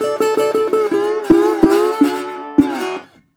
120, Blues Guitar; ILIO - Fretworks

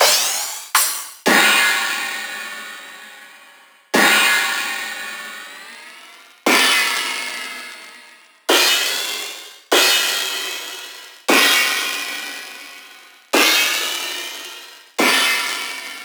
707 mod Crash

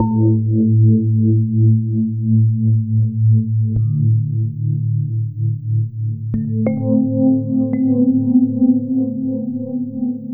FM Keys

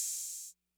Hats Open Accent Mid Decay, 808

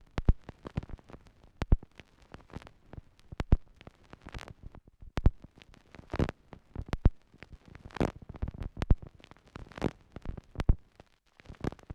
Turntablism; Sonokinetic - The Roots Drumloops

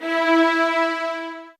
E, soft violins; Q Up Arts; Denny Jaeger Master Violin Library

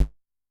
PULSAR, Kick, Yamaha DTXpress-II

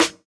Snare, jungle breaks drum sounds

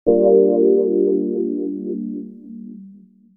Digi Pads; Jungle Frenzy 1

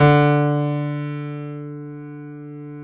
PIA D 2; Best Service Gigapack 1

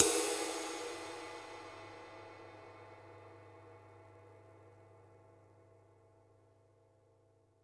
flat ride; JazzFunkKit

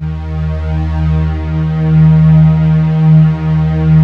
PROPHET VS, Vintage Keyboards

